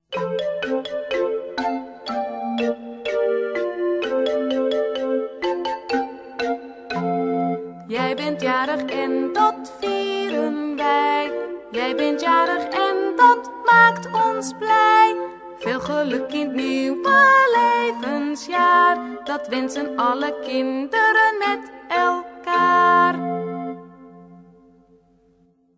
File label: happy happy birthday words and music; childrens songbook; dutch